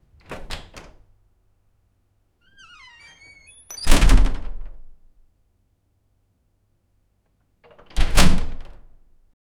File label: heavy door opening